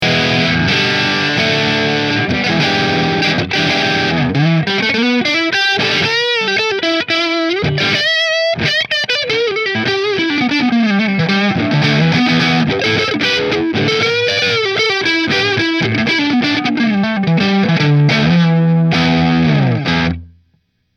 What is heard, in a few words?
Prestige Neck Marshall, guitars; Prestige Guitars Heritage Hollow SB QM